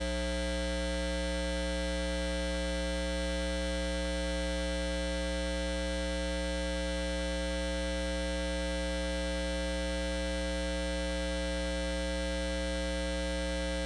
Electromagnetic Fields, Hummin; electric fields

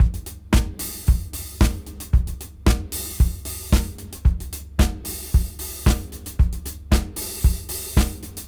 113 BPM; AMG; Keith LeBlanc Phat Butt Jams

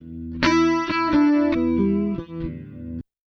Blues, Electric & Acoustic Guitar Loops; USB Soundscan vol.04; AKAI

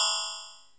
Blip Perc, Drum Machines

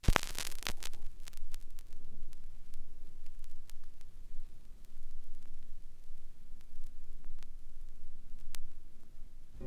vinyl; Masterbits; Vinyl Attack

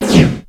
Ensoniq EPS Efx

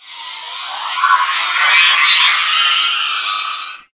NERVOUSLY, Disjointed Textures; USB Soundscan vol.33; AKAI; CHAOS

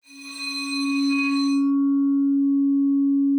arco C#4, vibes; Xsample VOL-4

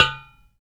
UDU, African; Supreme Beats; Spectrasonics; SB Udus

